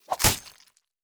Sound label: Stab